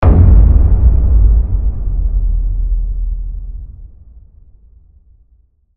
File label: brutal sub